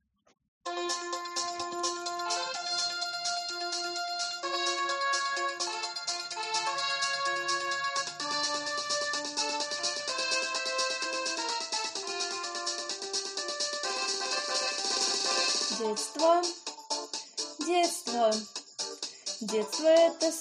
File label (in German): musik